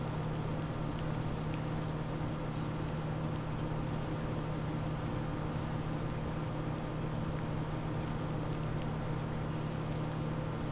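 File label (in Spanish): Ambiente bodega Barco, ship hold ambience; yesterday origins gamerip 2016 switch ps4 pc ios macos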